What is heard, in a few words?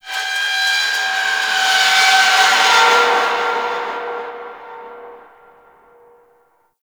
High Metal Screech; Warframe; Tau; Ambience